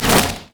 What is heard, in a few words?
basicattack; LoL; brand zombie